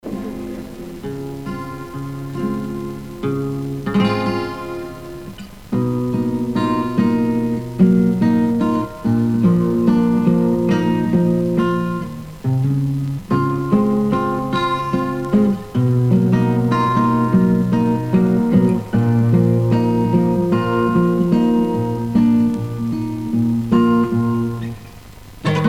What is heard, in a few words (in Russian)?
gitara; Гитара; Музыка из фильмов 70-х годов